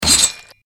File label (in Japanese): 斬撃